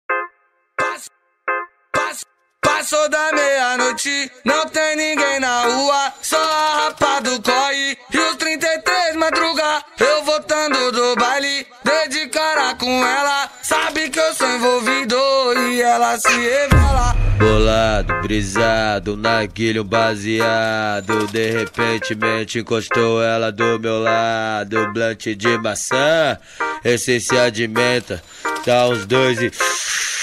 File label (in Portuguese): explode funk